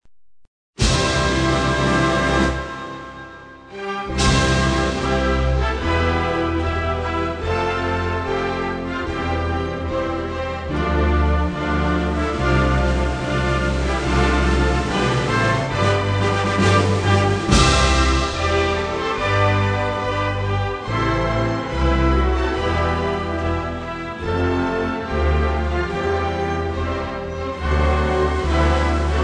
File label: Russian Anthem instrumental